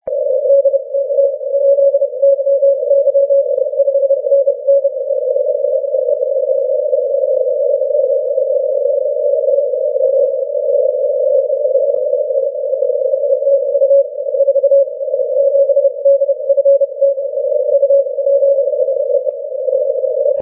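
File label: 80m continuous wave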